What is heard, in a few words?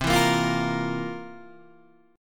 C 11th, C, down, Guitar, DADGAD